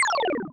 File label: coot faints